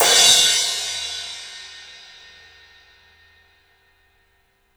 CYMBALS; 3D Sound; 3D Drums

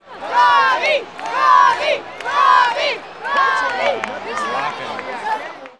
BASEBALL C, Baseball Crowd; SFX

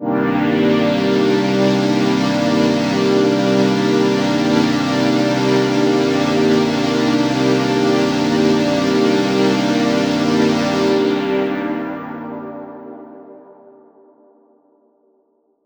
pad science c min; M8; M8JUNGLEPAK1

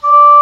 Eng Horn mf D4; Digital Sound Factory Virtuoso

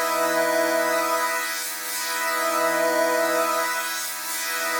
Pad Loop E, shimmer and sparkle; Pad Loops

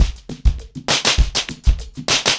100BPM, ACOUSTIC DRUMS

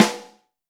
snare, Zoom MRT-3B

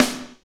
snare, R&B Snares, Roland

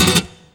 GUIT CHORDS; Best Service; World Colours

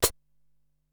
Pedal Hi-Hat, Ensoniq SQ-R-Plus, Drum Machines

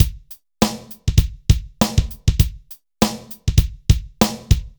dry Loop, BPM 100

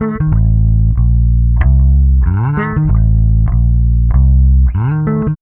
BASS, Funky Ass Loops; East West

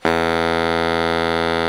baritone FF E 1, Sax; Giga Samples Collection

